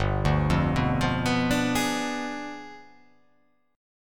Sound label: G 7th Flat 9th